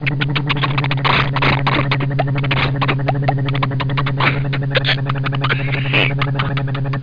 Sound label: Orb bg